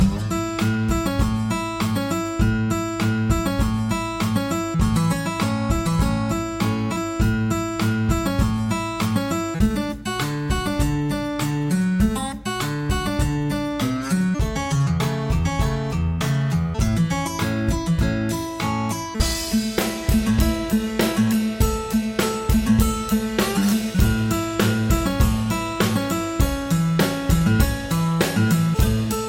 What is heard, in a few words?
Guitar Acoustic Steel Picking Country; Goodtime Ev8